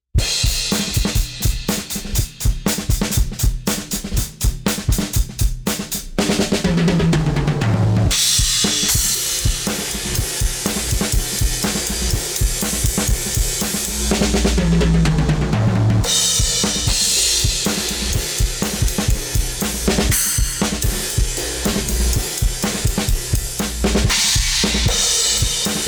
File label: Camel EQ LF B cut